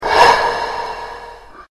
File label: attack; slender; team killer